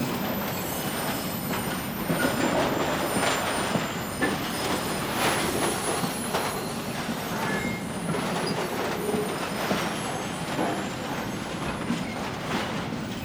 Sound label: train slow last legs